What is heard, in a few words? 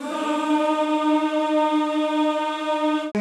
CHO, Roland; Sound & Vision; Gigapack I CD 2; CHO Zzzz + Vvvv; CHO Vvvvv